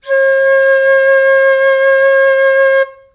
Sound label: bamboo flute 535hz